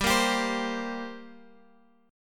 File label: G Minor Double Flat 5th, G, Guitar, Open G